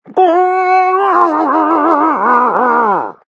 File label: pyro taunt head pain; tf